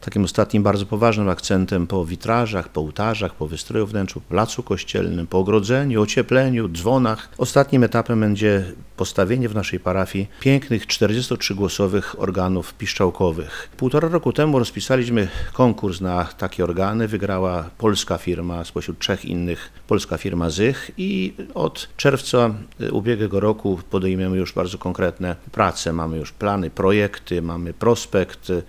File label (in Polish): organy